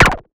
Brute Hit; FX Hit; Drums; Ableton Live 12 Lite; Core Library

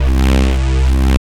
Synth Bass Drift Pad Wonk Bass

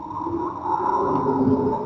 Eerie Wind

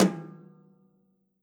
Tom Brush Hit Hard Sizzle; One Shots; Drums

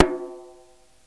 Djembe high; Convolution; IR Samples; Unusual

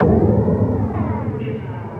Impact Robotic

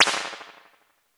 Springs Combo Spring, ImpulseResponses; Ableton Live 12 Lite; Hybrid

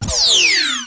delete space; Quicken

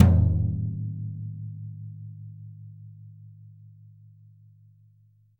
Tom Low Acoustic; Drums

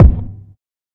Kick; Ableton Live 12 Lite; Core Library; Drums

